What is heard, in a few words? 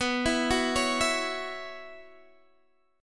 B Suspended 2nd Suspended 4th, Guitar, Standard; Bsus2sus4 arpeggiated; JGuitar